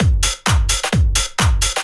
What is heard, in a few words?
straight, Techno Trance Essentials drum loops